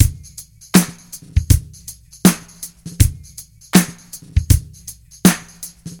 80 bpm breakbeat sample f sharp key, kick tuned to the F# note